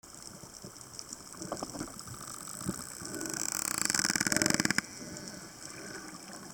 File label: burst pulse, dolphin